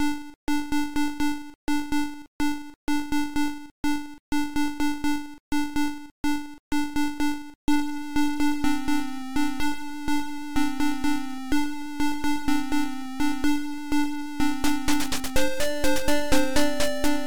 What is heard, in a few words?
game music; Infosoft; Cyber mouse